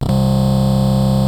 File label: PPG C1, Rare Synthesizer; Hollywood Edge; Giorgio Moroder Rare Synthesizer Collection; PPG.2.3